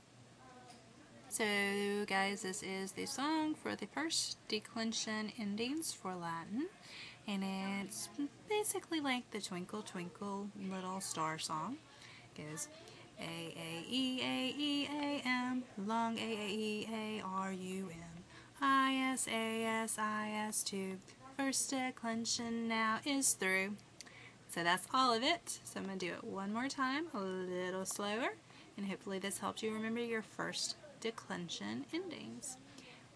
declension endings song

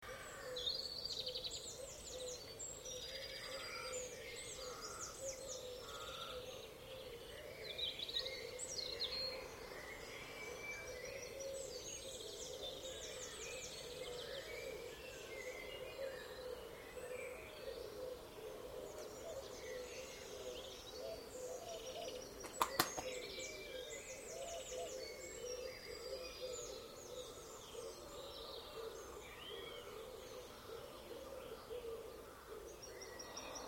the dawn chorus burgess hill

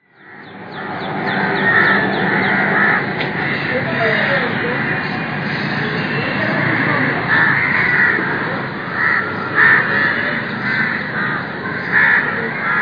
crows n parrots at mumbai park

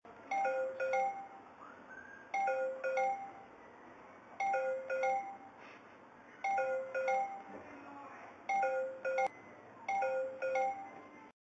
usb device fail

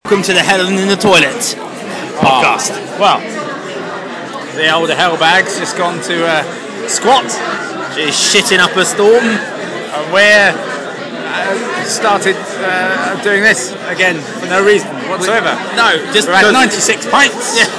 pub

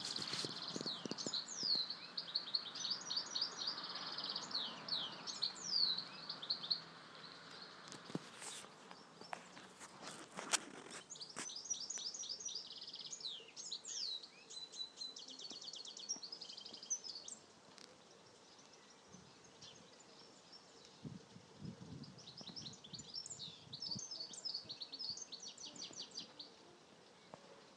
unknown birdcall

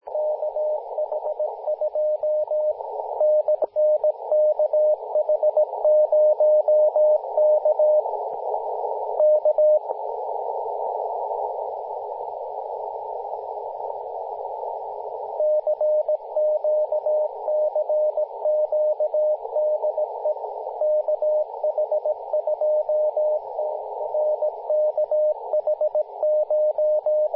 18.082 MHz cw; kh2 kh0k

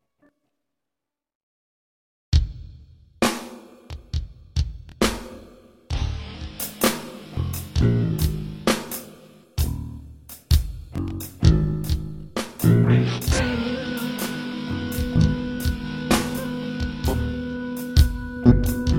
crazy jazz; testing the voiceband iphone app

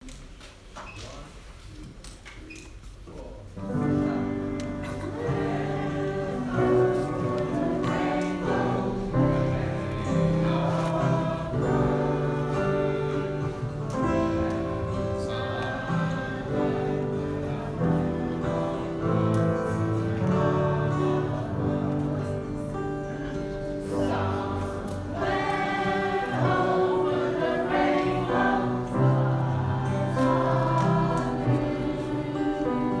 school choir; lansdowne

